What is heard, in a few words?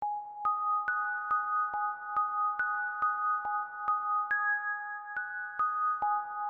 sunny sms